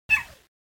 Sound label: yip